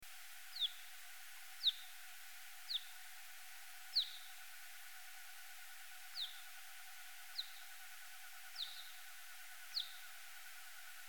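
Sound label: Myotis macropus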